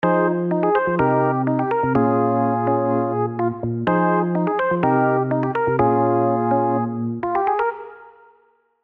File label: Samba Soul, keys; revealed diva keys vol 2